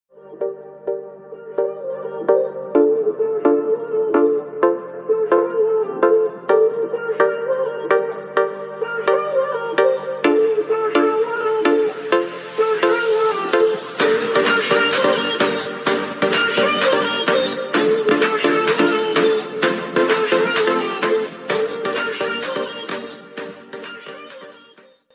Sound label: instrumental music ringtone bgm